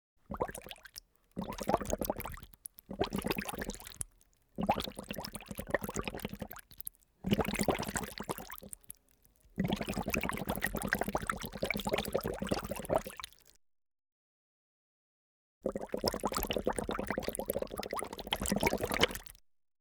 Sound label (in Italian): ACQUA BOLLE